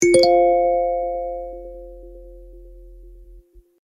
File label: chord iphone